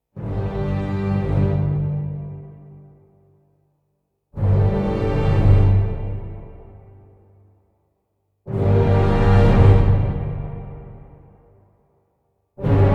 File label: No Choir